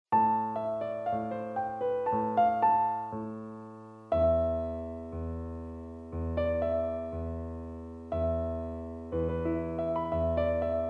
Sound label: Piano